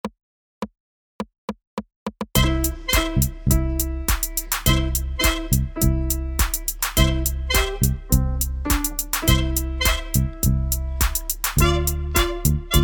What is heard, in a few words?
Jingle Bells